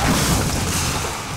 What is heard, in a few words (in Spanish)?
choque, vehicle; vehicle mod